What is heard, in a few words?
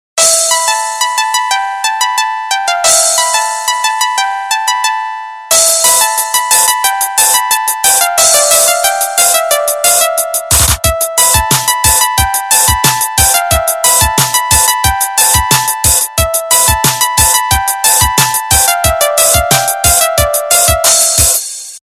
Ringtone